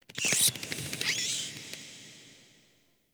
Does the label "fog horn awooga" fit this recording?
No